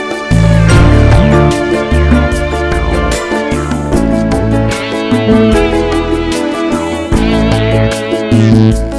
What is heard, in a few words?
Sweet Blues Ballad